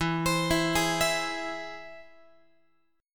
E Augmented E down Guitar 4A 4E 5A 5D 5G sharp 6C sharp; arpeggiated